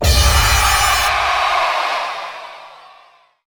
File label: countdown go; fallguys